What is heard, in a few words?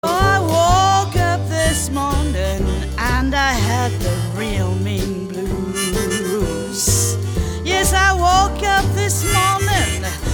deep down blues